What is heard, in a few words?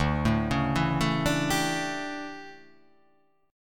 D Major 7th Suspended 2nd Suspended 4th D